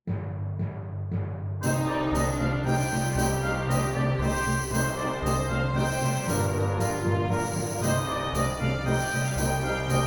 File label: Dorian Dance